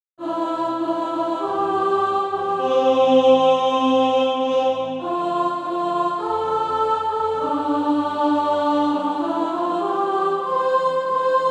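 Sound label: soprano, Practise Music; SATB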